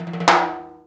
TIMB ROLL; Greytsounds VOL-1; SDX PERC

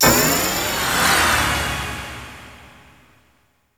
hit me; ILIO; Trance Fusion

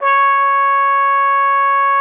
C#5, TRUMPET S; Xsample VOL-3

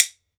WOOD BONE, Ireland

